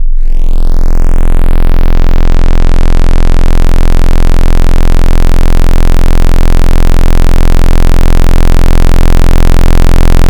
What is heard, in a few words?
Dist Zero Square, wavetable